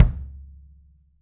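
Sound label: wood kick, right; 3D Drums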